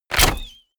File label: bow shot